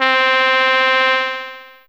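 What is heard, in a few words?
Trombone C4, Fairlight CMI IIe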